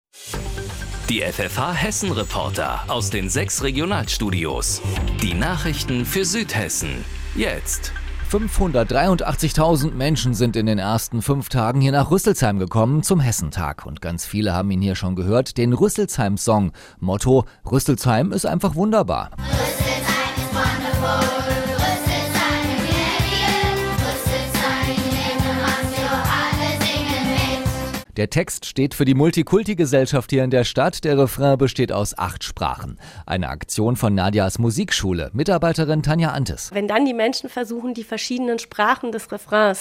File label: song; ruesselsheim